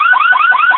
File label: car alarm; unreal tournament 2004; fav UT sounds